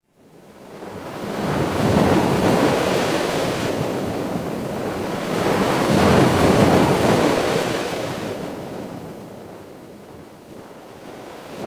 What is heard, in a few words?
ambience ocean